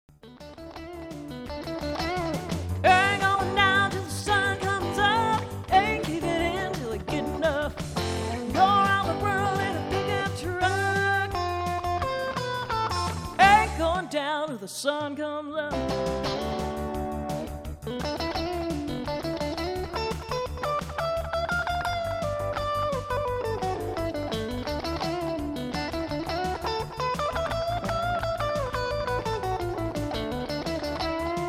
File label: solo